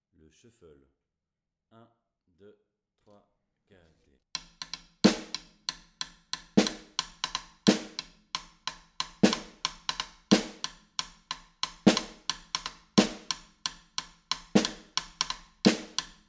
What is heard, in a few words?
Shuffle Snare, batucada